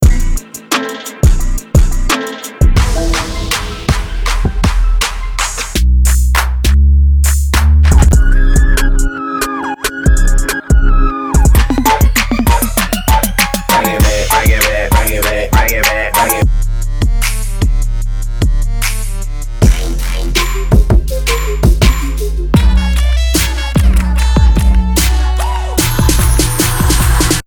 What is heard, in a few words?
Hip Hop Extended Pack